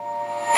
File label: musicbox